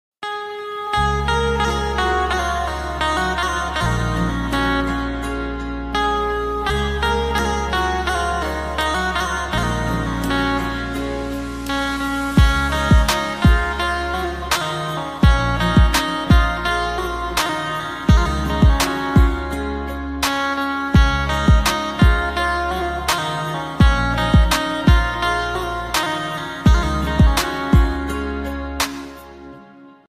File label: banjo ringtone instrumental ringtone bhajan ringtone